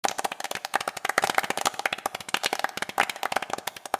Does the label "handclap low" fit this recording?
Yes